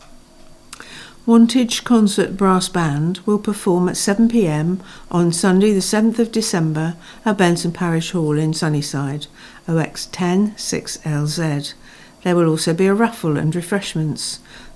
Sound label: Brass Band; R & A Wantage Brass Band in Benson